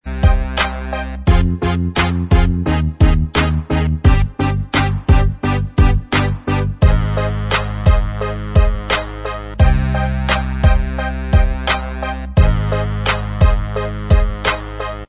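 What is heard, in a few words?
instrumental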